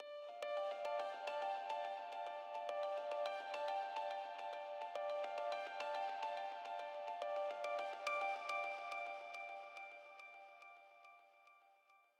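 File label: Tension Melody; nova 111; ps vita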